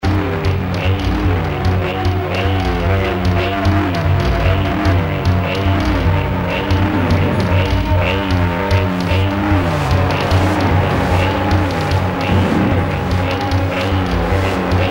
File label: resonance scan; 23. September 2006, 20:30, Sendlinger Tor, München